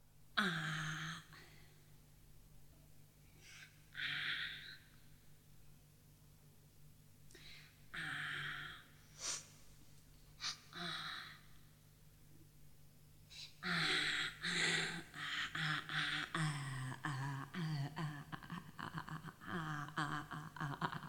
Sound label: live improvisation; nacht der poesie; thema a